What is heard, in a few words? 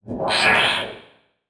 Multimedia Internet CD-Rom Flash Animation